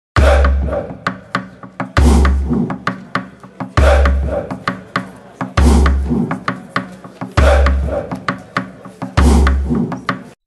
African Thump

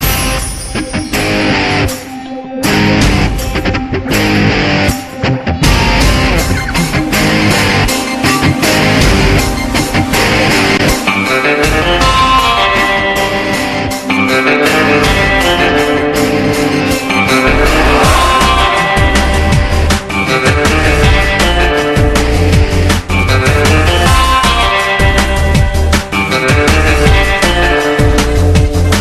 Rocking Guitar Mix

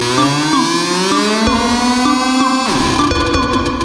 Digital Alarma

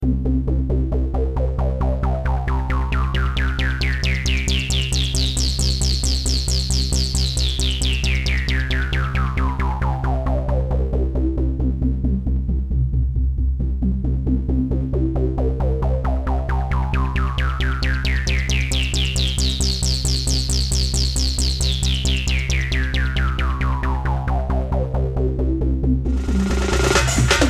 Super Techno Sound

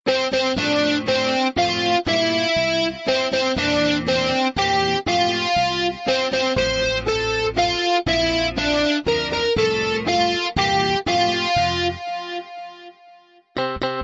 Happy Birthday Rock

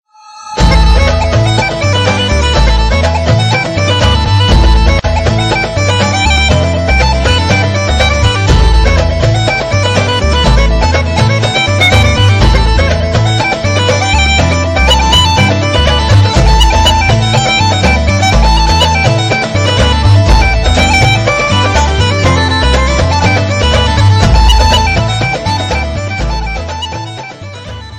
Irish Dance Music

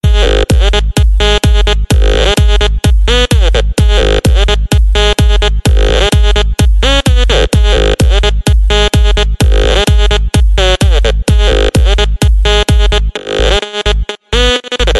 Melody

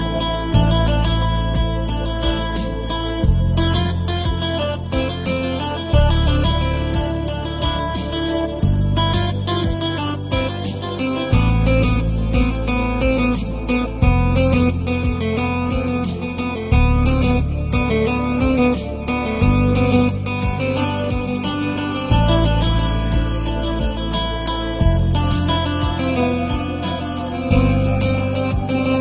Romantic tone

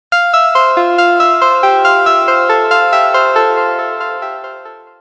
Greeting Tones

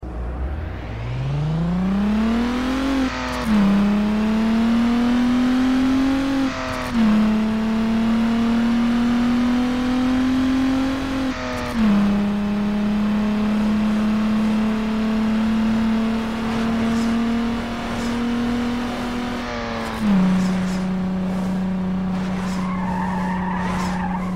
Car engine; NFSU

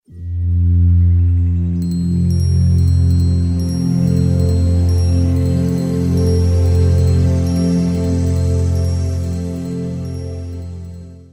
Linux Startup

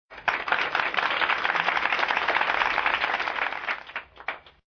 Claping Sms

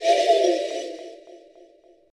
Spirit Appear

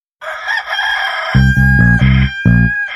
Rooster Rumble

